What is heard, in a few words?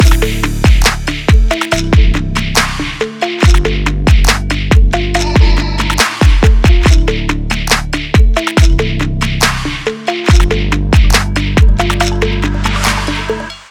stylish background